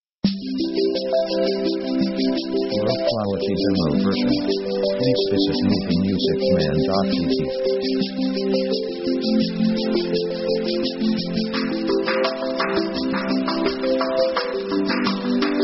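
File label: easy cheer up background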